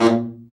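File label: baritone sax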